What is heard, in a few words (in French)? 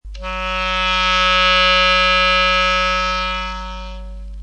sol, clarinet